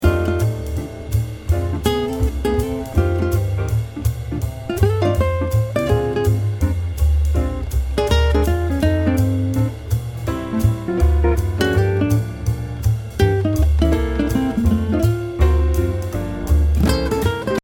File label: jazz